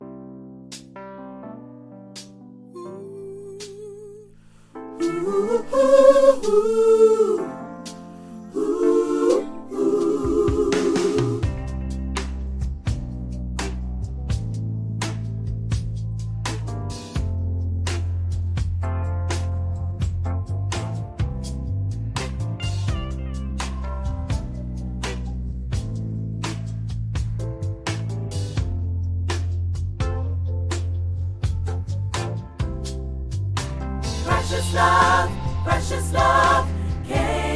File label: voices